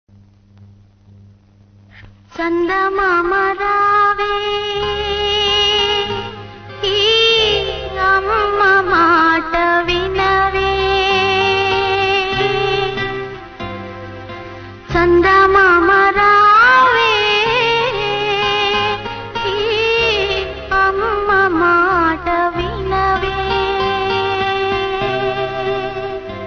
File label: chandamama rave sad, telugu